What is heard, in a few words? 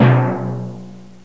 Timpani B; TG300B